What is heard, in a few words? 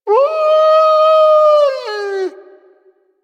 Legendary Kubrow Lure Call; Warframe; Hunting Critters